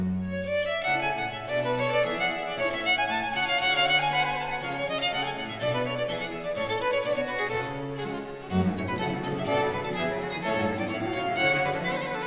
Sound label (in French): Pièces de violes, Suite in G Minor; Book 3, No. 41-44; Nicola Matteis; Ayres for the Violin; Quatrième Livre (Paris 1717)